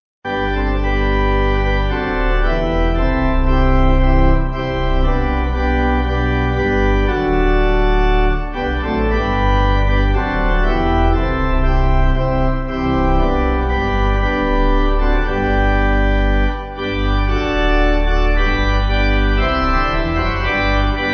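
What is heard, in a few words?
Pipe, hymn backing; My Heart Is Resting; After the Mist and Shadow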